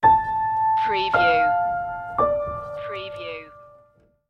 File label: the awakening stinger